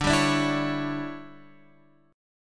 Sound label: D Major 7th Suspended 2nd Suspended 4th D, Guitar, Open F; DM7sus2sus4 strummed